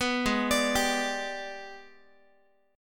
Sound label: Ab Minor Major 7th Flat 5th, down, Guitar; AbmM7b5; arpeggiated